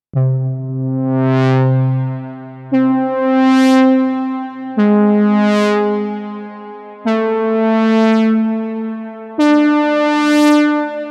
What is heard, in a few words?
Lead brass